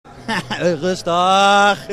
RUSTAAAGH

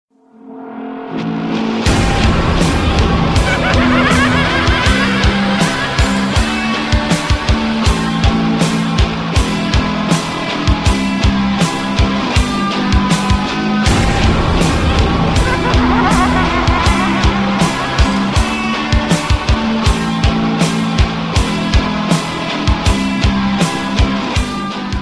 zombie

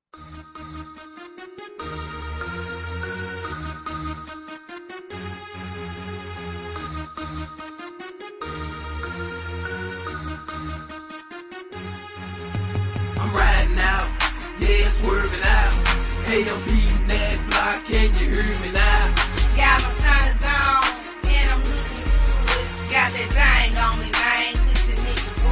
female rapper underground hip hop

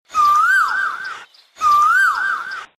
ring vs koyal

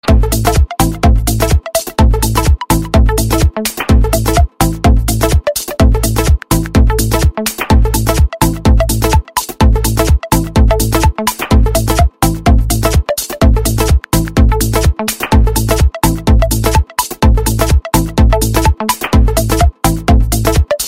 flute, ringtone

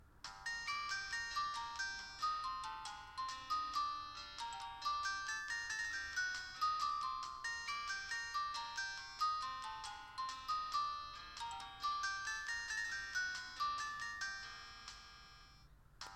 Romanza

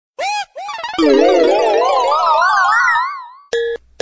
funny new tone

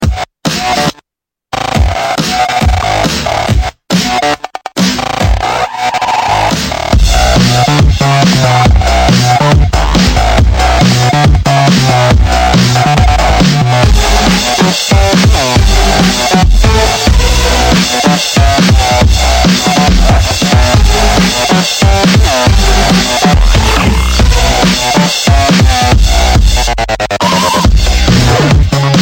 moto defy

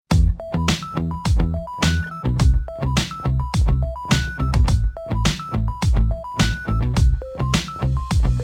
Tones

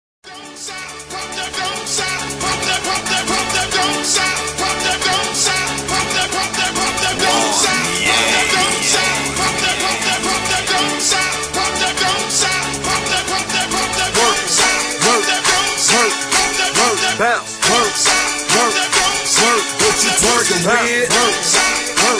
instrumental

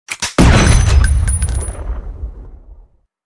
silver bullet

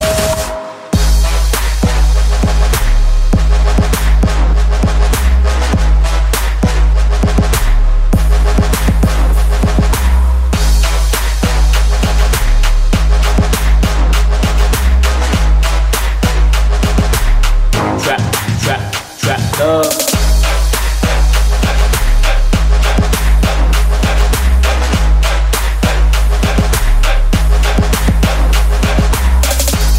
Big Bass

